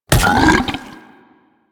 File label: Tendrils Digest, Infestation; Warframe; Warframes